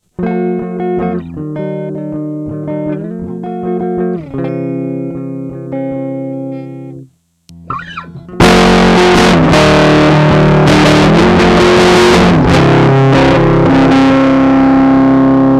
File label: buffered death loop guitar